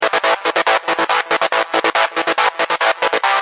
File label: techno synth, bass loopes; lmms